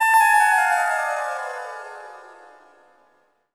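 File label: DIM DOWN, DIM SCALE FX; Zero G; Malice In Wonderland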